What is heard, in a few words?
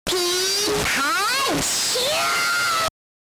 Pikachu SFX; RBY